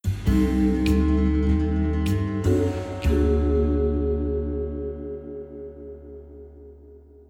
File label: Sting